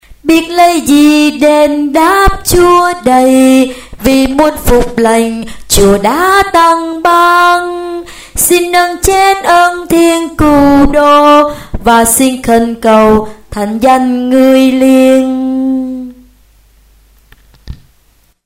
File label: tenor basso